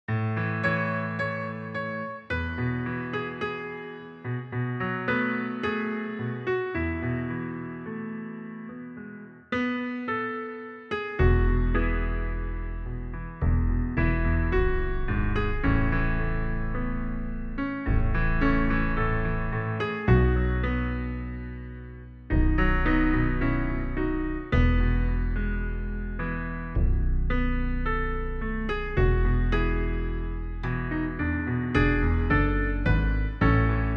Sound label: New Every Morning backing; New Hope Music